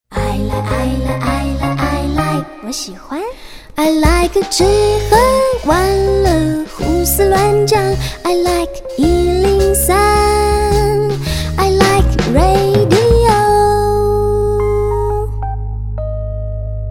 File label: swing Jingle